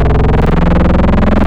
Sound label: Spectral Growl, wavetables